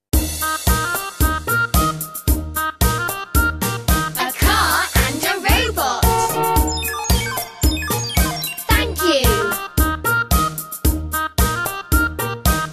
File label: Number song English; Adventure Starter